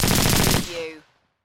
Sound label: Machine Gun H K MP540 Long Burst